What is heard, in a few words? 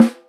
dry steel snare drum sample a sharp key